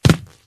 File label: concrete land; Golden Crayon Sounds